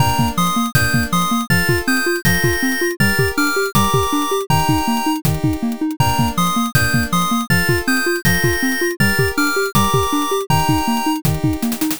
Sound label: bgm